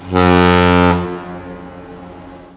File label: foghorn